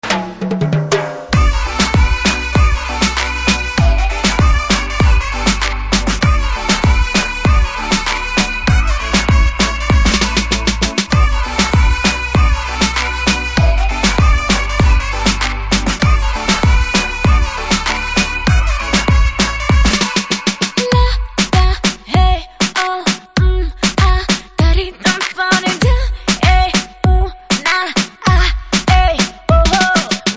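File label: background music Ringtone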